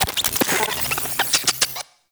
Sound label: Venus Robo Fish Large Caught; Warframe